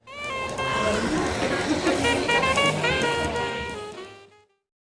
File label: coffee shop tap; ea tsto; sheights